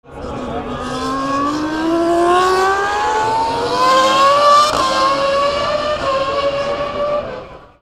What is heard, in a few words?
F1